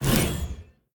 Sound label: swish; smite; tiamat